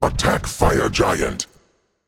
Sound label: vox attack f; smite; baronsamedi